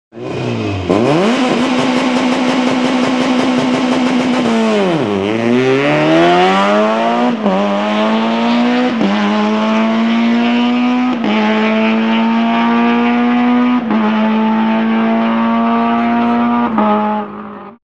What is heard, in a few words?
Toyota Corolla S2000, start; monza 2007